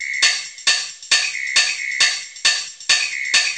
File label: 133.5 bpm, whistles and hats, drum loops and breaks